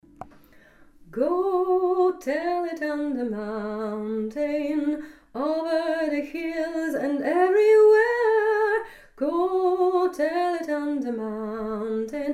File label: Go tell it on the mountain soprani et alti